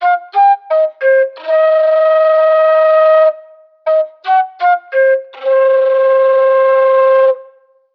flute spy satellite, Loops, Jazzmatazz

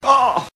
"ah", Voice

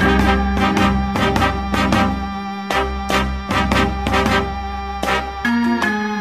horns, Loops

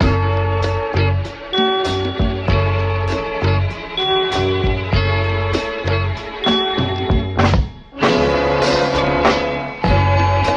horns funkdified, Loops

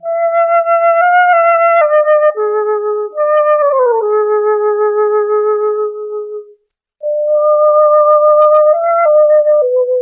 flute, Loops, Sad

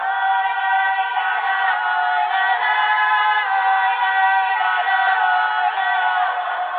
voice, Loops, Sad

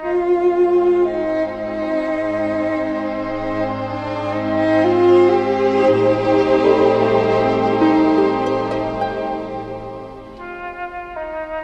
strings; Sad

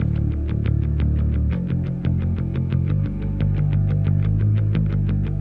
bass

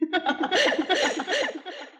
laugh echo; Voice; ooh ahh

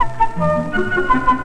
flute, Loops, Phonky

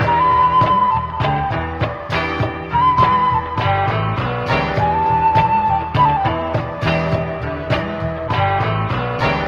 flute strongarmed, Loops, Jazzmatazz

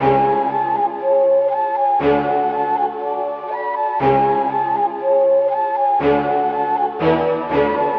keys

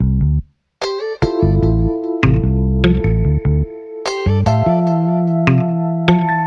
keys and bass, phonky loops

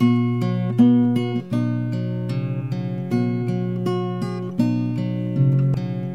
guitar, Loops Sad

